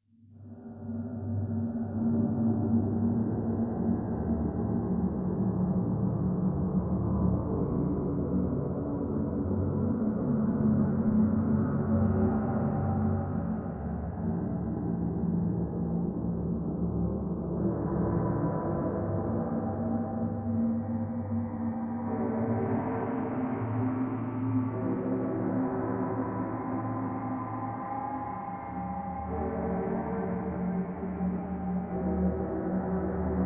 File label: holloween amb; Identity V